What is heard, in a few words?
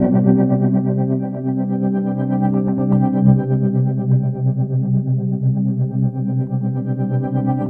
TREMOWASH, STRATICUS; Spectrasonic Distorted Reality 1